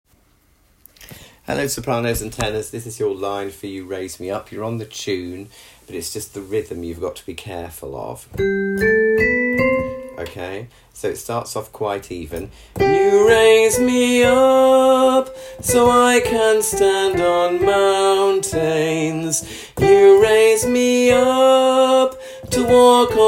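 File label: Soprano Tenor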